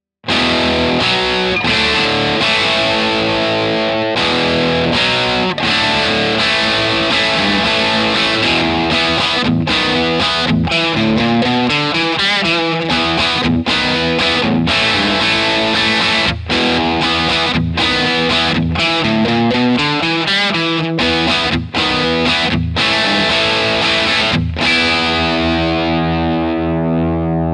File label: rock chords; jensentone